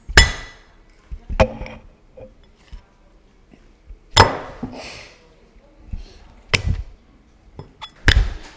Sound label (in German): Wurst auf einen Teller schmeißen; Wolfskraft